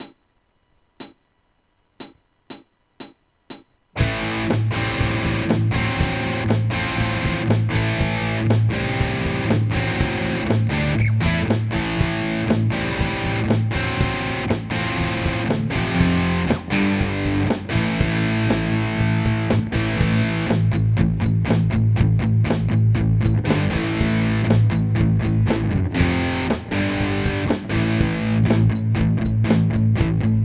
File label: 120 BPM A Minor; song in a hat 2025